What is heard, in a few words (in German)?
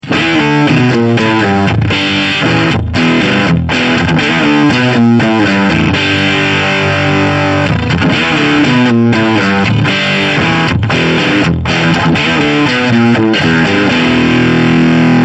MXR Vergleich Dist + Boo